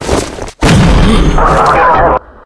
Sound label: apc arrest; hl2